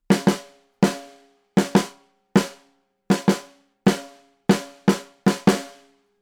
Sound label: Soyuz 013 FET snare